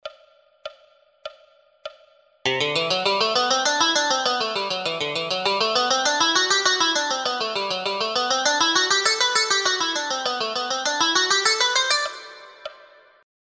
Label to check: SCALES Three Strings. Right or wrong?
Right